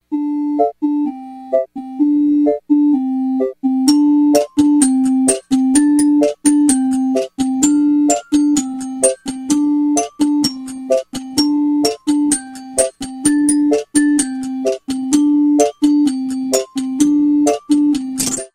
instrumental

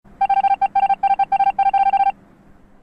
death noise; among us

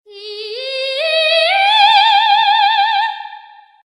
funny sound

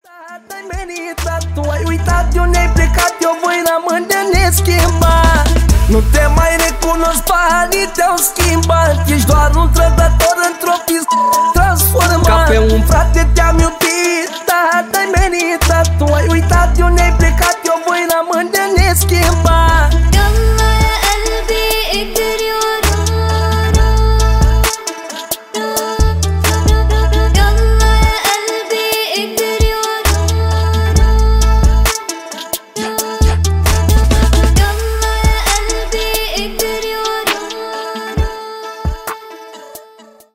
Sax

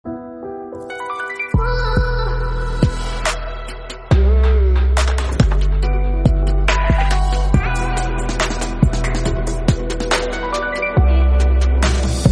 Oppo F11 Pro New

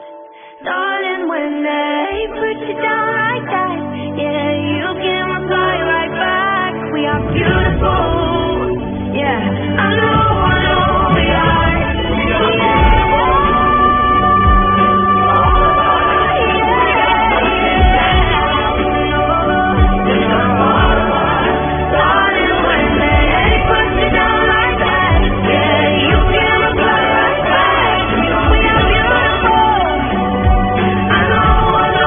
Orchestral Version

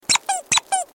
funny squeaky

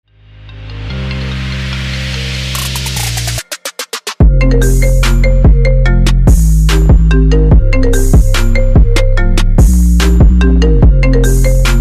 Iphone XI

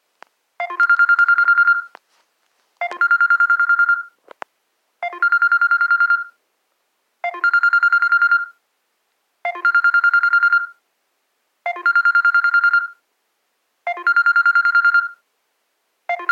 samsung basic tone

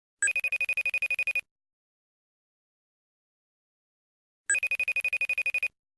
iPhone Pro FaceTime Notification

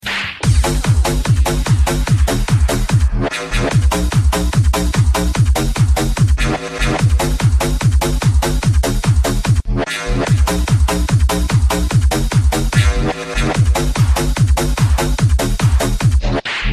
bass attack

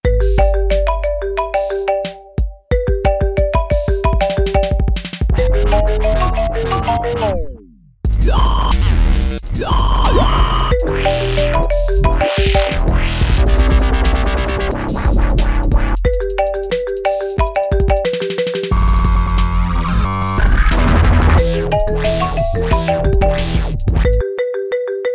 dubstep iphone

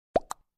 among us chat

